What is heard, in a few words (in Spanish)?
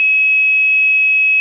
tono